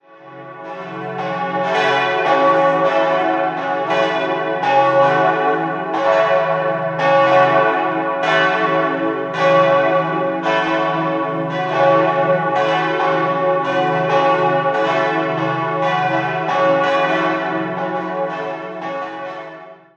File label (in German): Konstein, Filialkirche, Glocken; St. Ägidius; 1003 und 689 kg; im Jahr 1959; Erding; in Bronzelegierung; Ein Geläute in einer für Dorfkirchen dieser Gegend ungewöhnlich tiefen Tonlage.; Karl Czudnochowsky